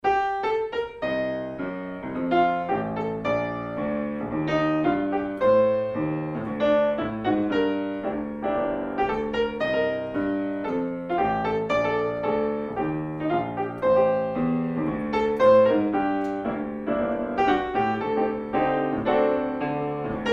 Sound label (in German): Klavier